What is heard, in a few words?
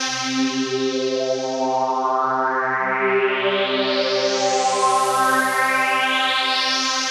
Phasing; Techno (Техно)